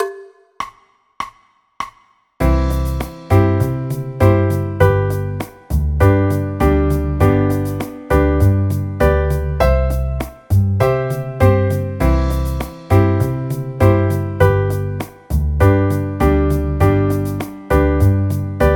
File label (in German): c dur akkordverbindungen